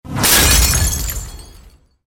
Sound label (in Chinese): 拍玻璃碎